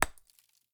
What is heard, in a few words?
sling stone, attack impact